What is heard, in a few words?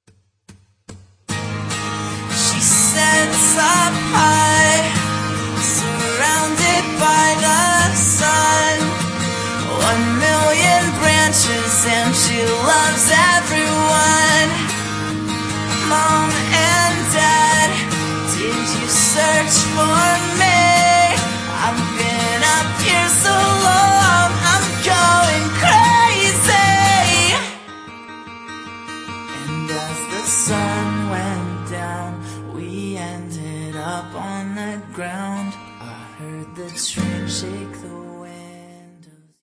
studio acoustic